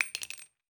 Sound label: Bullet Drop Into Concrete; GameSFX